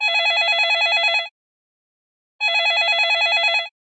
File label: Traditional Telephone; Honor; X9c; ringtones